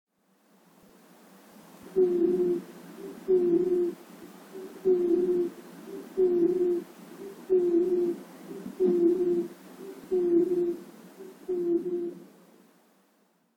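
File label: Japanese Night Heron; mizogoi; mogusa; koe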